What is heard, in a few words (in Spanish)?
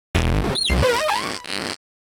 Grito de Skuntank